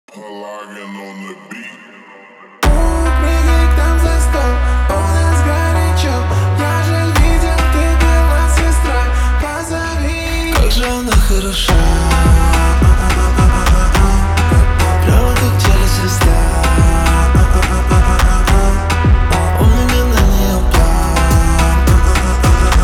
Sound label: slow reverb; kazakh